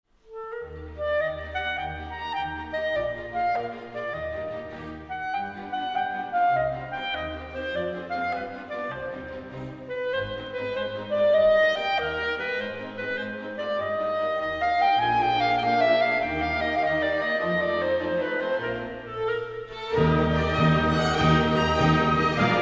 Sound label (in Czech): Klarinet; Filharmonie G.Mahlera; František Kramář: Koncert pro klarinet a orchestr č. 1